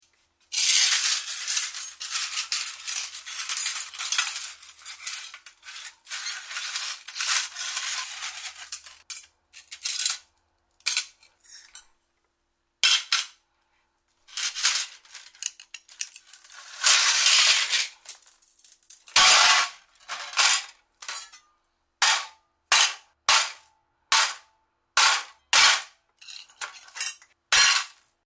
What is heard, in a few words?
Clearing up pieces of broken glass.; Materials